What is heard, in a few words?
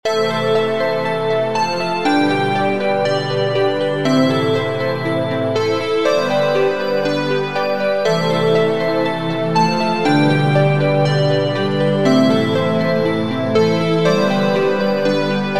Synthesizer playground